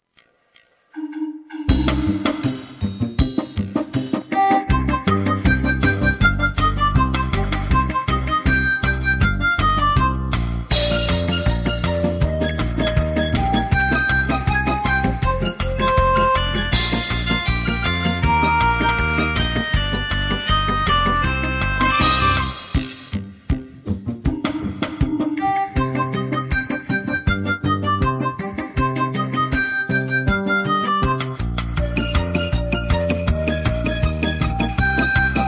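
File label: backing track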